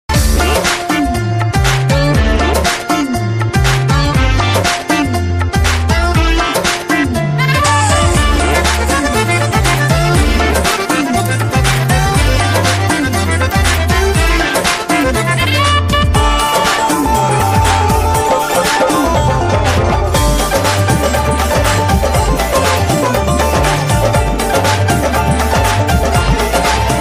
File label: piano